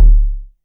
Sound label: SUB KICK; Back In Time Records - Big Bang